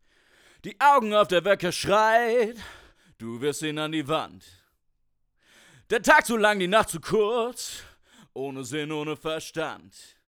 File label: Vocals